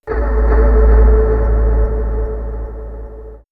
Swirls, pads; X-Static Goldmine

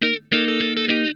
Funk Guitar; Zero G - Funk Guitar

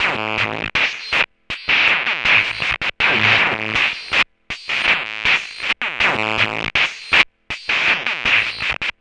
Drums Strange

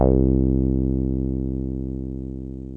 TB303 TRI, ROLAND TB-303 BASS; Rubber Chicken Software Sample Bank

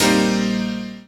CHORDS; EastWest; Dance Mega Hip Hop Electro

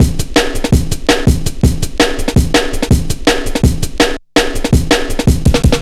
165, Drum Loops, Drum & Bass

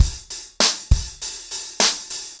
100BPM, ACOUSTIC DRUMS

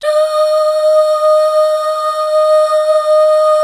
Duh Fem; BACK. DUUHH; HAL

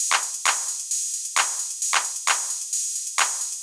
Beat Perc Mix 1, Belgium Beats 132bpm; Big Fish Audio - Old Skool House; OSH Construction Kits

